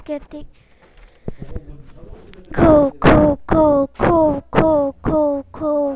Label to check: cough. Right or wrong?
Right